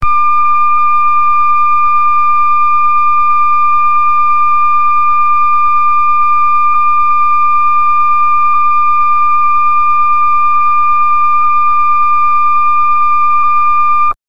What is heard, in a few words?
trailer alarm bending sou